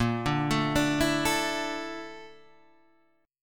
Bb Major Sharp 11th Bb down Guitar Half Step Down, chord